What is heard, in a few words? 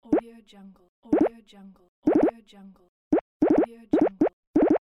bloop